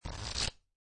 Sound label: Cards Playing Cards Flipping Through; Playing cards, poker. Flipping through cards.